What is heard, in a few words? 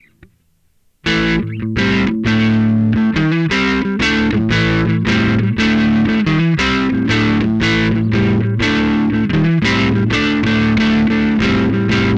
sansamp gt2 crunch